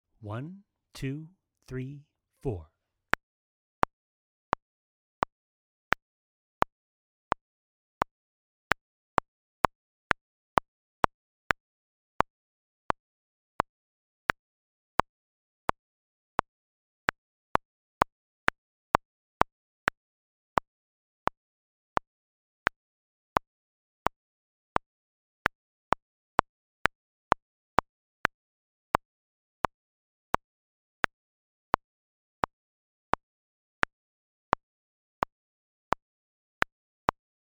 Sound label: Maniacal Metronome